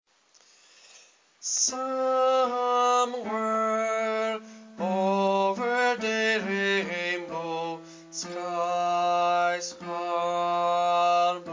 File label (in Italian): bassi, coro; Coro Isola del Giglio